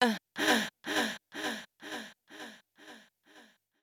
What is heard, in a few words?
VOICE GRL HOUSE 125